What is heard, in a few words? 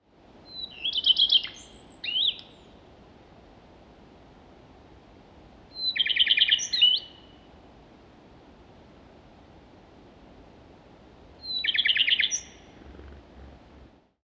Paprastoji Raudanuodegė Common Redstart - Phoenicurus phoenicurus